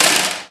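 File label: physics clipboard impact small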